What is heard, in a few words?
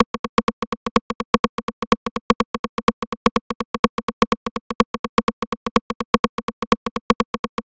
909 Rim Loop